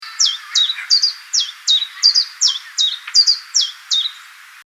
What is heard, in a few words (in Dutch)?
tjiftjaftjudu; Vreemde zangertjes